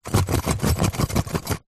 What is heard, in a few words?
household pouch small contaning metal manicure tools shake